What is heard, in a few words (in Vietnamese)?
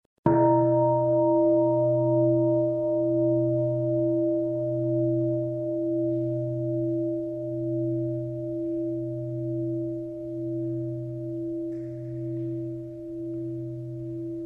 tieng chuong bat nha